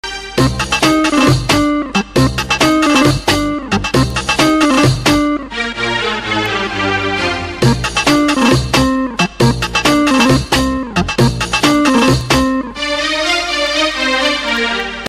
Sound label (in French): Belle Sonnerie Arab